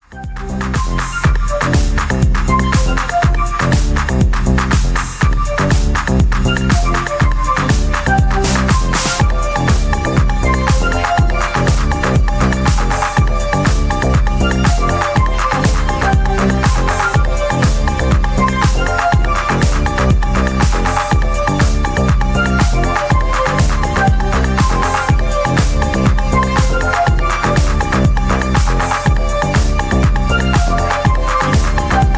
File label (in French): orgue electronique; disco